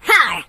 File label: Jump, voices, Pirate; hedgewars